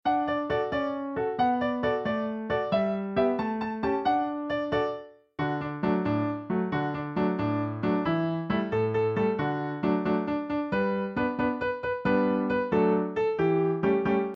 fiddle de dee instr

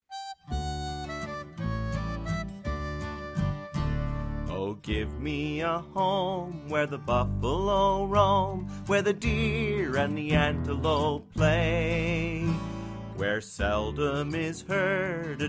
home on the range vocal; home on the range song

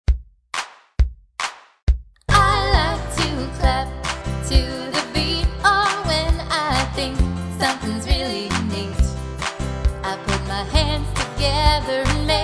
I Like to Clap, song

